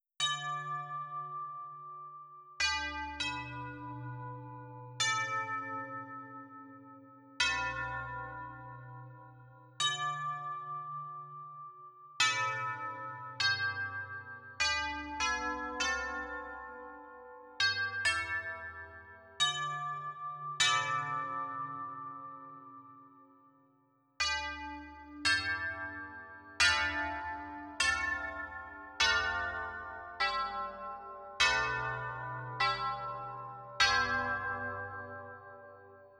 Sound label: bell 100bpm C